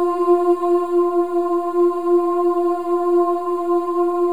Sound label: OO F4, women; Spectrasonics; Symphony of Voices; WOMEN OOS